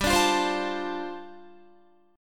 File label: G 7th Suspended 2nd Suspended 4th G, Guitar; G7sus2sus4 strummed